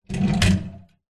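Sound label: household bathroom medicine drawer close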